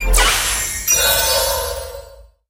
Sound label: bell